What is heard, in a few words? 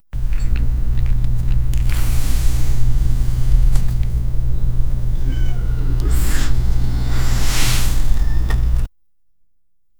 sound design for a flower, flora